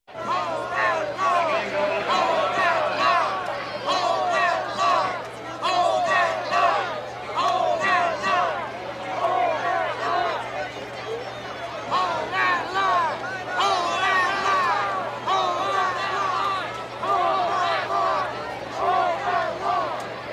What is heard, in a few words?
College Cheers; SOUND EFFECTS1